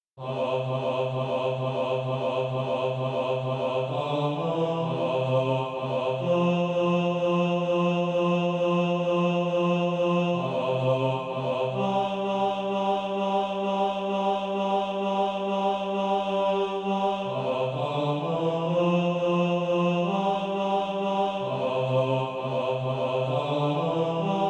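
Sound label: bass, SATB Practise Music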